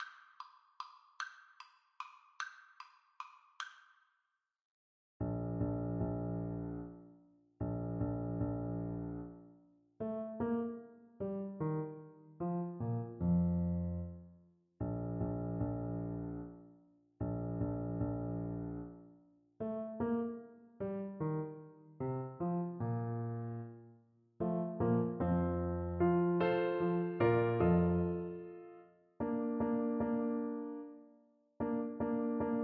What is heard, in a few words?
Clarinet